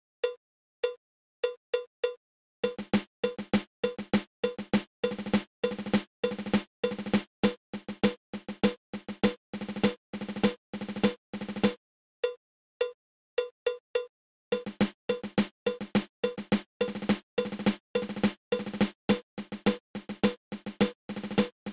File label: Five Stroke Roll